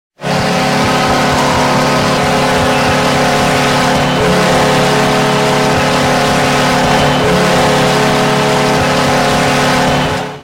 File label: goal horn